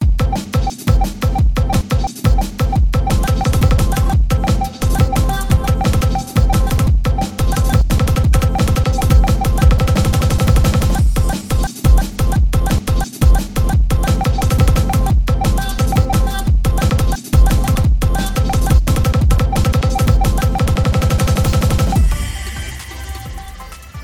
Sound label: OnePlus Tone